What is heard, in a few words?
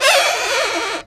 Tight Fit, cartoon Foley Fx; musicradar; cartoon caper